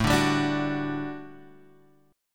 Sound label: G Major 7th Suspended 2nd A